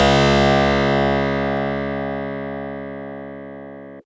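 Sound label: Fuzz Clav DX100 C#1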